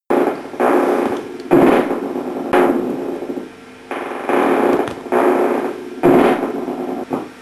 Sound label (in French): MYOTONIE